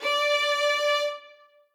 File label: string